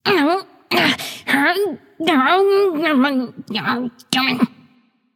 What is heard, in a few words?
grumble light; smite; voicepack; hel